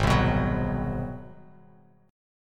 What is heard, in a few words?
G sharp 7th Sharp 9th, G#7#9 chord, Bass Standard; strummed; 4,3,9,11; JGuitar